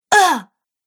Female Fighter Grunts; Grunt Sound Effects

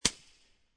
44 Suppressed Pistol Barrel; War; VRSonic

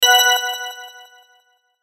Hit Ring Shimmer Delay; Blastwave

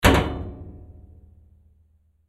Washer Door Close, Washing Machine Appliance; Laundry; Household; Blastwave

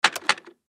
Button Switch; Multimedia Web Interactive

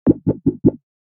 Beep And Bleep Sequence

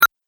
Click Sound

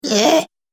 Goblin Horrid; Monster; horror; Monster Sound Effects

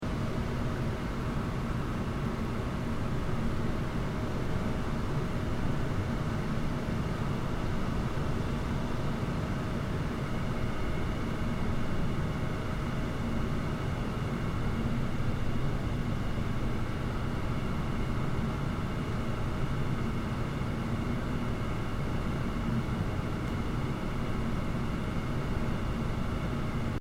Room Tone Office Air Conditioning Computers; Urban Spaces

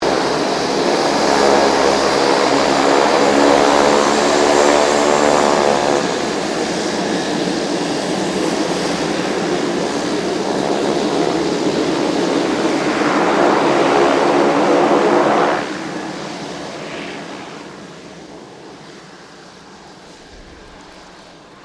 2007 KING AIR 350 TAXI; Airplanes; Vehicles

